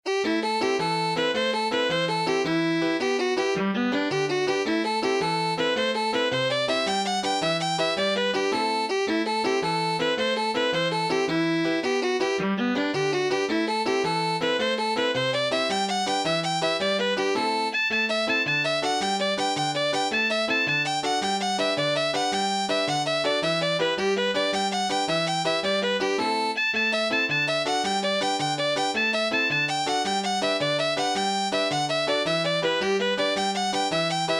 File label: jig set